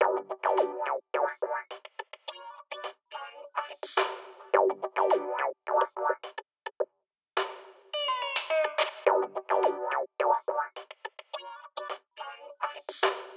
BGM Lobby Versus Speaker Shop

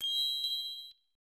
Coin Toss; Zico Soccer; snes

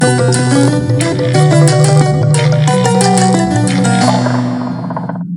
Creepy Collections

